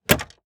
Auto Saturn Close Glove Box; Transportation

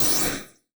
Flash Blast; Multimedia Internet CD-Rom Flash

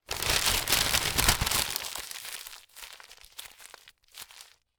Household Paper News Paper Crumble

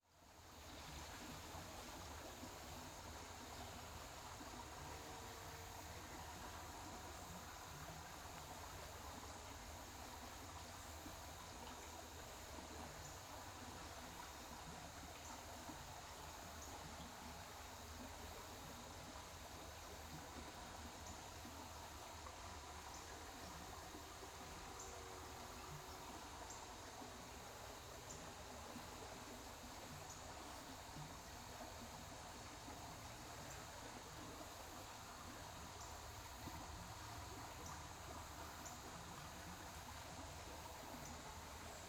Ambience Creek In The Woods; AdobeCC

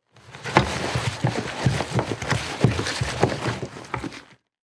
Impact Gear Military Gear Dragged Through Brush

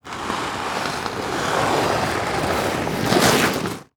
Weather Snow Duffle Bag Dragging On Snow

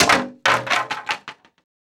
Impact Tray Lunch Tray Falls To Floor; Impacts

